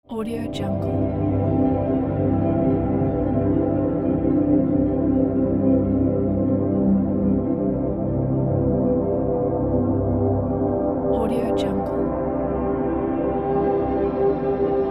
star space sky

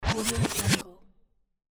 tape rewind; pozitifdenge

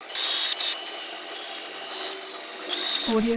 robot motor complex motor computer data access; prosoundfx

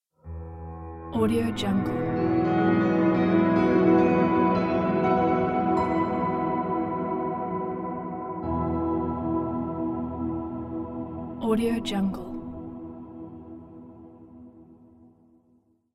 atmospheric piano logo

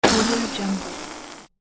balloon deflates quickly; sound-ideas

